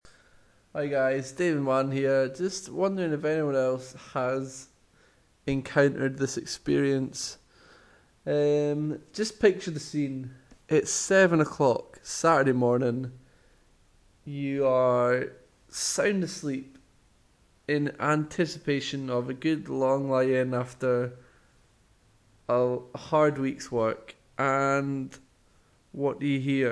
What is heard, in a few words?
seagull